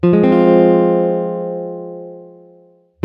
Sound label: f minor, chord